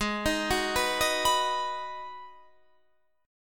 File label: B Major Ab; B/Ab; arpeggiated; JGuitar